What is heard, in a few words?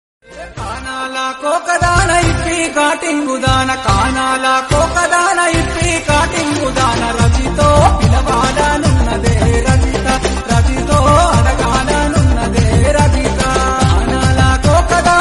DJ folk songs